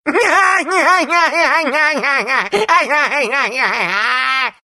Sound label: laugh; meepo